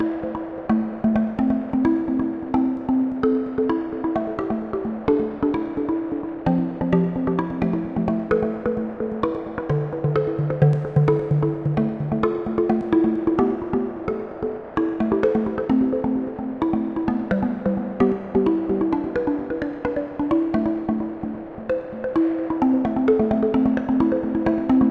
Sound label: bgm